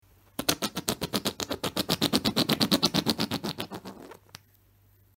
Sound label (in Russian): podushka perdushka